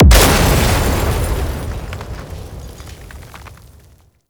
Car Explosion; Warframe; Nineteen Ninety Nine

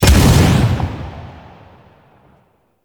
melee slam hit; Warframe; weapons

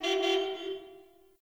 Distant Car Horn

